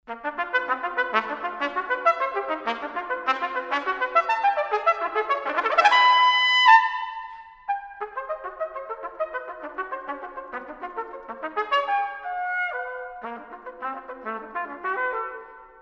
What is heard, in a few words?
sonatina toccata; henze